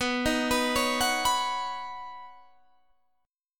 B Major Add 9th, B, down, Guitar Standard; arpeggiated; JGuitar

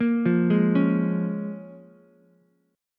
Bb Minor 7th Ukulele, Bbm7 chord; Bbm7 arpeggiated

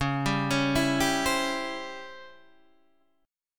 C sharp Minor Major 7th Flat 5th, Guitar, Open D

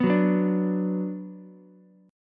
Gb Suspended 4th Sharp 5th, Ukulele; strummed; JGuitar

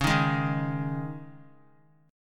Db Suspended 2nd Guitar 4F,4A,5C,5G,5C,6E; Dbsus2 strummed

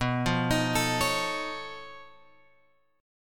B 9th Flat 5th B; B9b5 Chord {7 8 X 8 10 9} arpeggiated

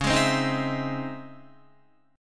C sharp Minor Major 9th, Guitar; C#mM9; strummed; JGuitar

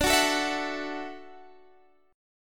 D-Major Add 9th, D, Guitar, Half Step Down

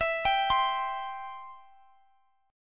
E Minor Sharp 5th, Guitar, Standard; Em#5 arpeggiated; x,x,x,21,20,20; JGuitar

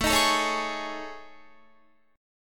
A Major 7th Flat 5th, down Guitar; AM7b5; strummed

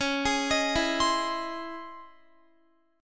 C sharp Minor Major 9th Guitar; C#mM9; arpeggiated; x,16,20,20,16,20

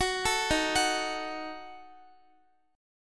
D sharp Diminished, down, Guitar; arpeggiated

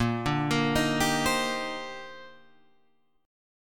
D sharp Major 13th, A sharp, guitar chord

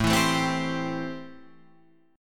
A-Minor 7th A; 5,7,7,5,8,8; strummed; Am7

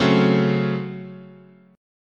Db Major 7th Suspended 4th Sharp 5th, chord

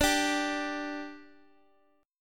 G 5th D, chord

